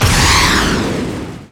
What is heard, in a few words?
Enemy Jet Skill Car Turn; gamerip